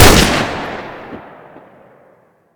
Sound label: Dragunov Shoot; Modern Weapons Pack